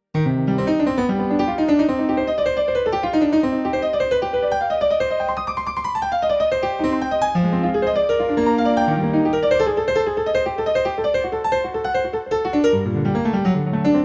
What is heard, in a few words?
Instrumental, Solfeggietto